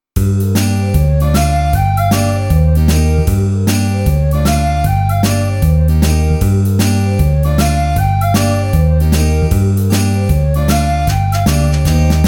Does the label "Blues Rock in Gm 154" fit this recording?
Yes